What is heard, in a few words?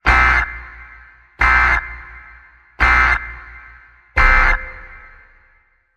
Apocalypse Radio Alert